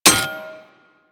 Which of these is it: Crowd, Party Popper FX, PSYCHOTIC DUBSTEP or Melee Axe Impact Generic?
Melee Axe Impact Generic